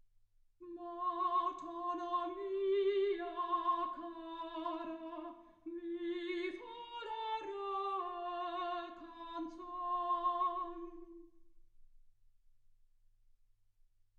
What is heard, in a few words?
soprano